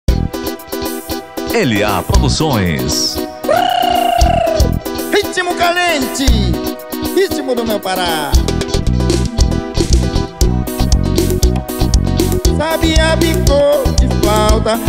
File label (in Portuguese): bico de flauta